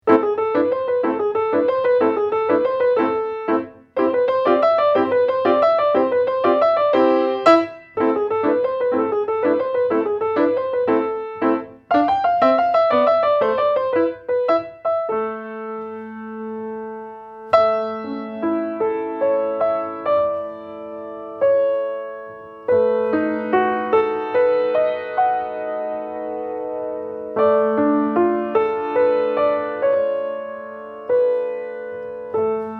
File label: Tarantella Italiana